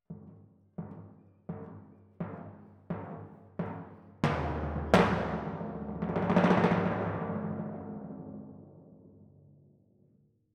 Twin F Timp

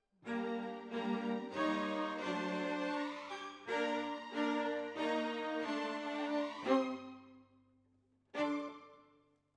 Violas, jazz for piano

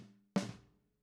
Snare

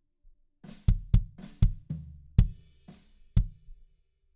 BD IN

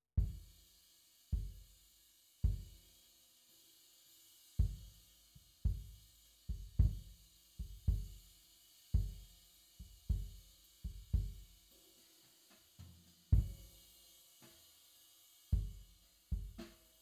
Kick IN

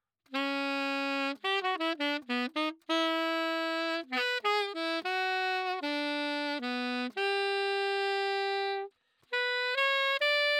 Sax

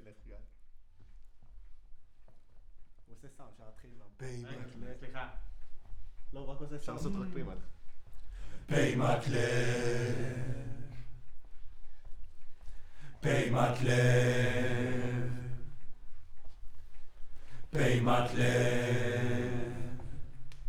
Choir

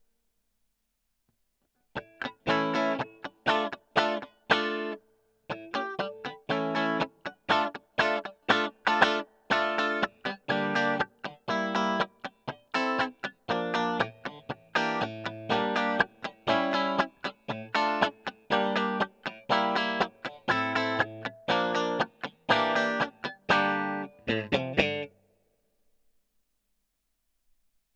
E GTR; Gefell

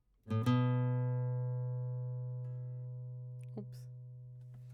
GTR

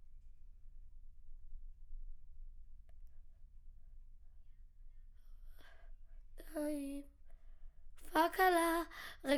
Children Choir; Studio Bekol Children Choir

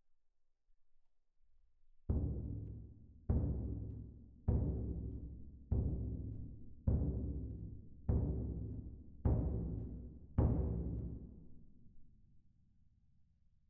Perc

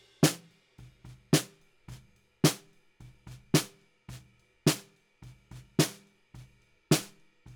Snare Bottom

